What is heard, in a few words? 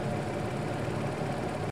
police car idle ext; Original TK Sounds